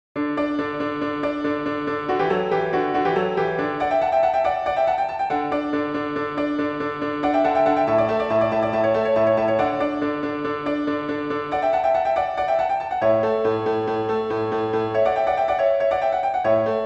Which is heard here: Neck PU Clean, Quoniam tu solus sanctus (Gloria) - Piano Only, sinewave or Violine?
Quoniam tu solus sanctus (Gloria) - Piano Only